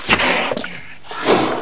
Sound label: SPIT ROAR; ANIMALS